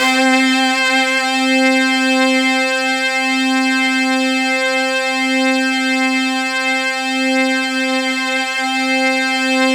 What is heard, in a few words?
C4, Synth Line; 90 s Sample CDs; NiceBeats; SynthLine VOL-26